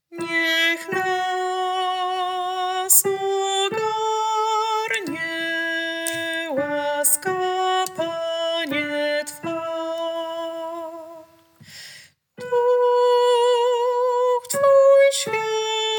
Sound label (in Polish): Sopran; Niech nas ogarnie; laska panie twa laska pana